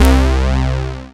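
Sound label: synth analog; Best Service Gigapack 1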